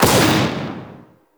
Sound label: Mortar Launch; Warframe; Raptor